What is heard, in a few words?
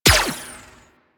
Laser Gun Fire; Shield Osprey